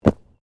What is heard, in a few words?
Body movement Ground Impact Light; Body movement foley to simulate falls and deaths; Wrestling And Struggling Sound Effects